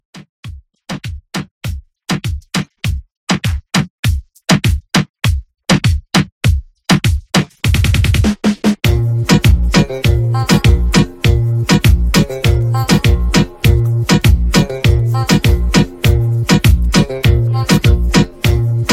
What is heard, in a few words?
Latin Moomba